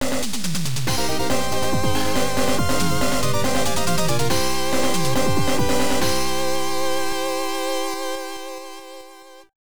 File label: Game clear; rusty pc-98